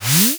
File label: whoosh fast